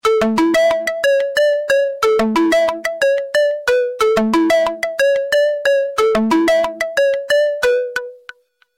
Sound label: ring